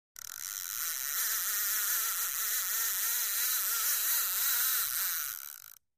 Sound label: Fishing Line Out